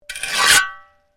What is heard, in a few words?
Shovel Scrape; Working with a shovel or spade; Scrapes; Objects